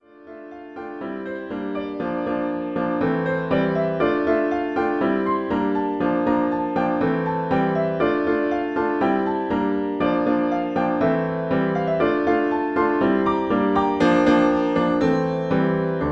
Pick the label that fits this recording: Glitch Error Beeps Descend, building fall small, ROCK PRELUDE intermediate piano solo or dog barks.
ROCK PRELUDE intermediate piano solo